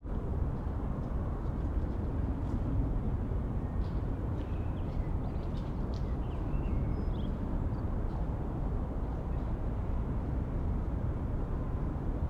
AMB CITY Terrace Distant Rumble Traffic Pedestrians; Urban City; Systematic-Sound; General Ambience Series